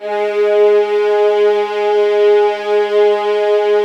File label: G#3, soft violins; Q Up Arts; Denny Jaeger Master Violin Library